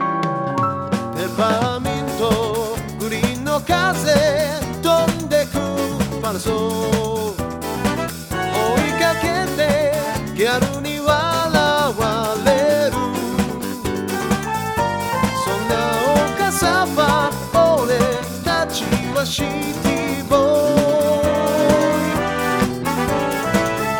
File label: piano wide range focus wet; audiobrain